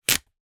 foley cheque rip from book stub

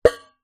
foley tin can with lid peeled back hit lid twang

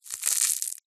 bird chick emerge from egg shell cracks; animal

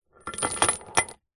chain med sized set down on concrete paving slab; industrial; zapsplat

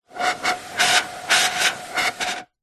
foley cardboard tube blow air across rim opening; zapsplat

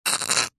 liquid gel suck in med short

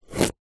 carrot slice knife; zapsplat

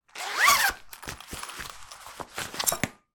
sport badminton racket remove from cover sleeve; sound effects one

